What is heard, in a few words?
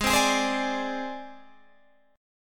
Gsharp Major Flat 5th